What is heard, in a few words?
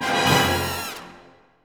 Combo Stab